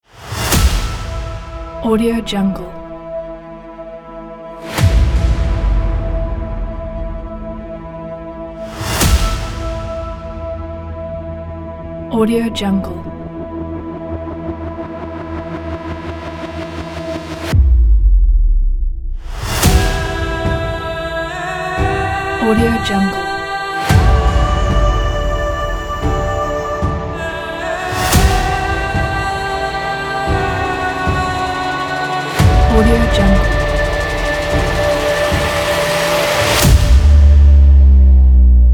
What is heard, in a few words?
emotional trailer